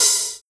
Hi Hat Open

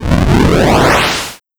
Noise Rising SFXR

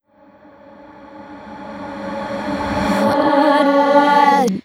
Riser Vocal A

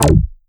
Hi Tech Drum Kick Synth

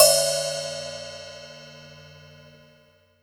Hi Hat Open

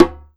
Perc Djembe Tone; Circ Afrique V4

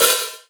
HiHat Open; pitchglitch samples; Rytmenpinnen

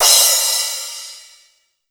Crash Short; pitchglitch

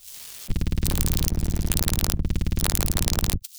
Noise Stress Signal

